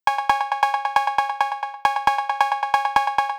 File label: 135 DRUMLOOP; Loopmasters; Booty Breaks Vol.2